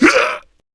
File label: Pain, Male; Warframe; Grineer Male B; Battle Barks